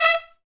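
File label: BRS TPTST, brass trumpet stabs; Roland L-CD702; BRS Tps Stabs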